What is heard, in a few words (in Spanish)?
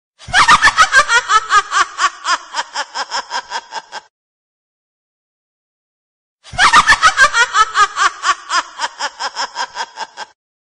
Sonido Risa Malvada de Mujer, Risa Malvada, Efectos de miedo